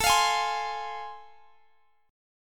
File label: A Minor Major 7th Sharp 5th A, down, Guitar, Drop D